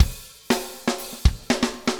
Funk Rock Beat, Drums

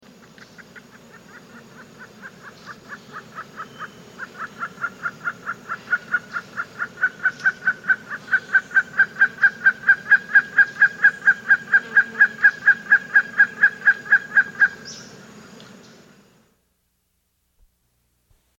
Gray-Headed Kite; S. America

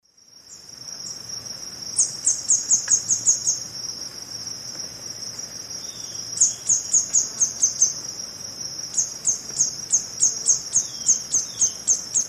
Epinecrophylla ornata, song male; S. America; Eastern Lowlands

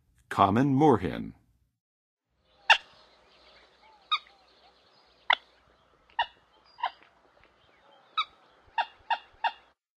Common Moorhen; North America; Western USA; Western USA Songbirds

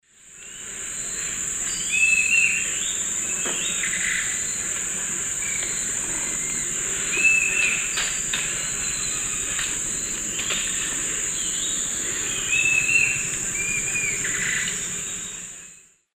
Tyrannulus elatus, songs 2 birds; S. America; Eastern Lowlands

